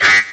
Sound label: metal bling; Zaps and Beeps